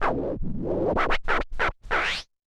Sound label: OLDSCHOOL SCRATCH 96